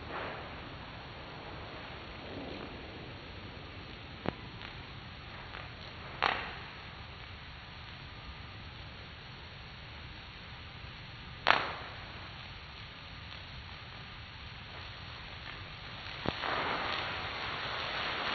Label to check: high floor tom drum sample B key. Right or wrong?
Wrong